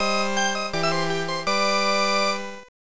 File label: Lose (Hit Octopus)